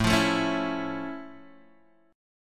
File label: F Augmented Major 7th A